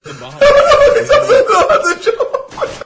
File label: laugh; moe